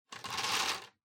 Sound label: Bingo Machine Noise